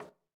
hit Soft; advanced-roulette

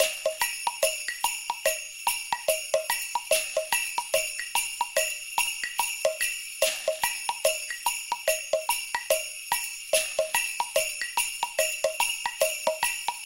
sleigh Loop; xmas; alohaxmas

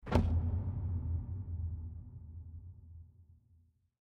reel Bounce; rise of maya

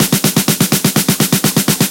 music loop snare fill; disco danny

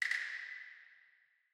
nudge; rise of maya

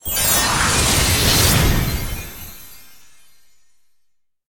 fairy Throw; fairyred

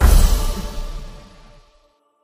scatter Hit; archangels

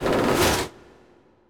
Rotate; eggomatic

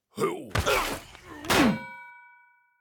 symbol Headbutt; robin hood

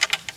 boost Window Open; rage of the seas

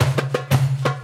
spingle Drum Loop; wild bazaar

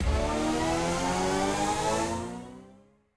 respin Snd, slots; king of slots

